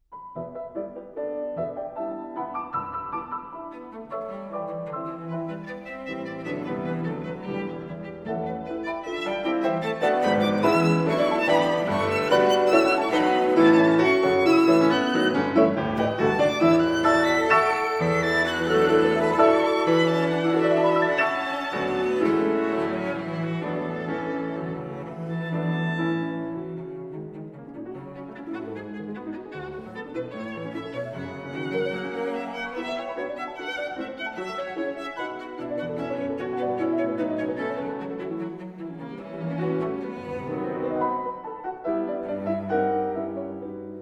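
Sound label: piano trio; moeran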